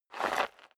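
step dress boots gravel light slide short, footsteps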